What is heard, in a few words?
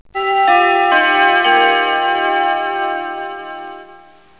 carillon; Compton's Interactive Encyclopedia (Version 6.0)